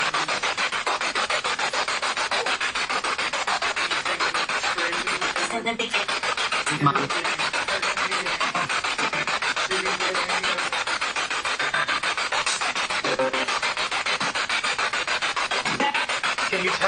Living Room Spirit Box

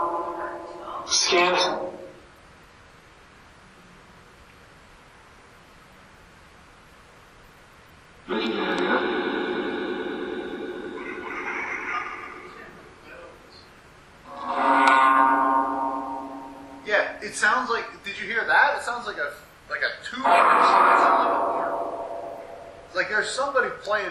Auditorium Phasma Box; beaver area high school investigation

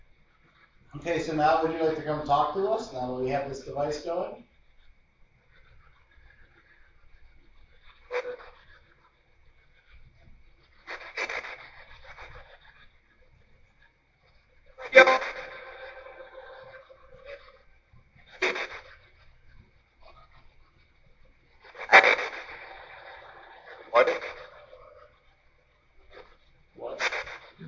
Living Room Portal

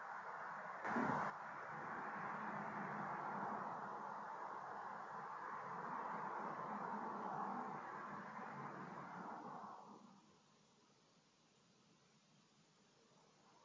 3rd Floor Hallway EVP; fairfield county infirmary return investigation

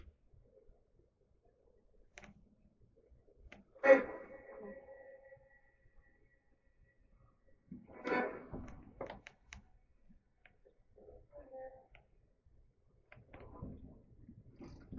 Up Couples Room Spirit Box; wexford general store antique shop return investigation

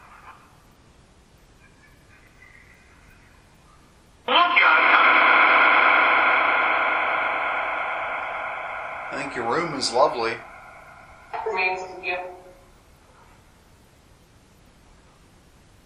Canopy Room Phasma Box

